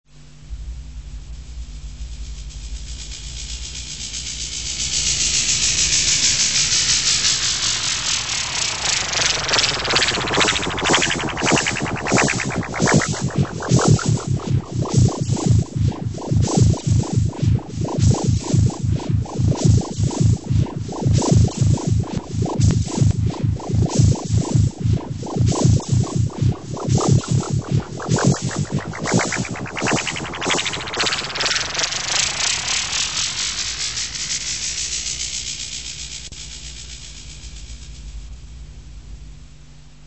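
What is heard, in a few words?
alien sound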